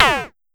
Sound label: Tom Lo Mid, Pollard Syndrum